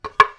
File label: Use Object; Lara; Playable Tutorial Level